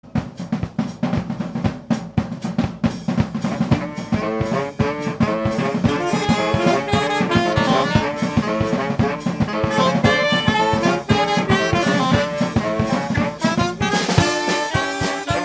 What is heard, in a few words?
saxofolie